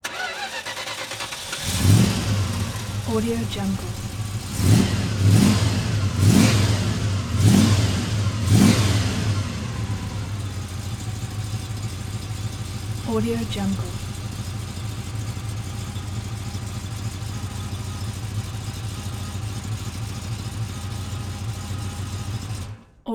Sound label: plymouth start idle off revs ext; استارت زدن ماشین